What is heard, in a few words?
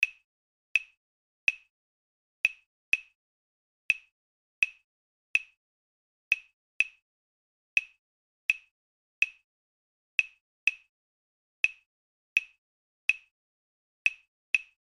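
son clave, drums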